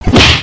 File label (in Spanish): golpe; regio